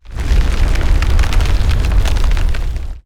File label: earth fissure